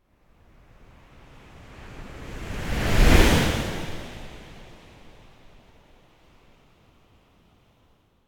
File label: Wave By Strong, Fast And Deep Wave - Passing L to R Wave Whoosh; Hollywood Edge; sound designer's toolkit v2